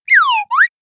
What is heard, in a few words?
notification; samsung Aleart